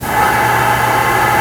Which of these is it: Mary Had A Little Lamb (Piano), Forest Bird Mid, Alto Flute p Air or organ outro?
Alto Flute p Air